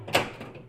unlock door

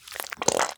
tomato squeeze

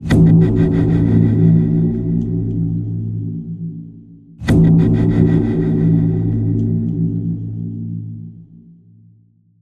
bell high delay

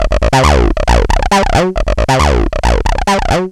SYNTH

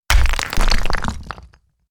boulders fall hard

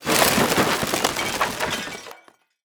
glass crack break

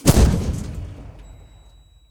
tank cannon fire

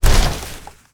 car impact

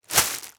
plastic bag shake

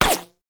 bullet fly by

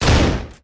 Shotgun Fire

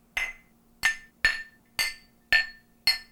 glasses clink

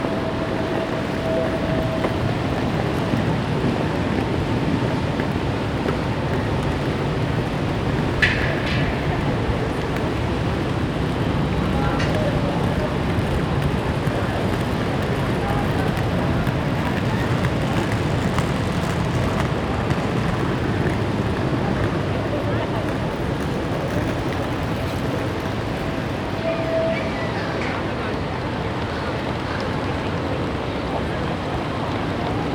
city plaza ambience

